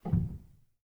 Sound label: rel, Grand Piano; bitKlavier Grand; Piano Bar